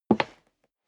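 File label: step leather shoes wood creak single left, footsteps; schritte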